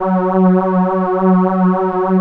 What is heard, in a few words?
Atmo Pads; Best Service; XX Large